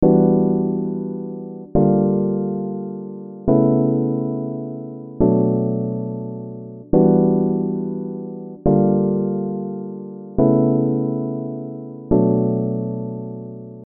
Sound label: piano; 4front